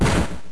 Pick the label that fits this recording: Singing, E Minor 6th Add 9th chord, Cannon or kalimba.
Cannon